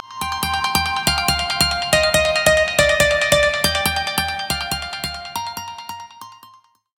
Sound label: Delay Piano; Coolpad; Note 3